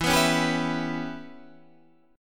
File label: E Minor 6th chord